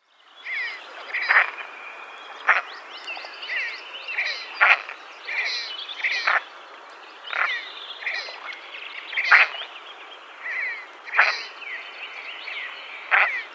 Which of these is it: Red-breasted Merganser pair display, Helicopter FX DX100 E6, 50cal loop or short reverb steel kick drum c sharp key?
Red-breasted Merganser pair display